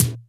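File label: INDUSTRY KICK; Big Fish Audio; Synth City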